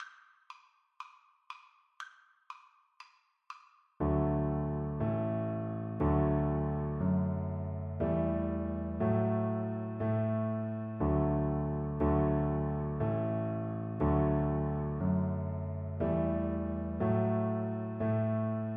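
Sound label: VLC karaoke, Cello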